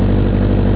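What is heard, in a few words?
engine idle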